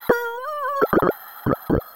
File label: anger strong; VIVAE; vocaltrax